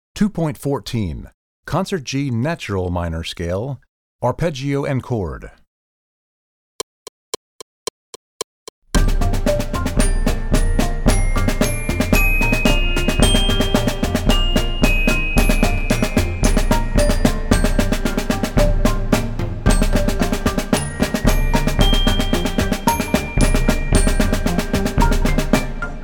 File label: Concert G Natural Minor Scale, Percussion